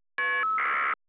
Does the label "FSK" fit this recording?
Yes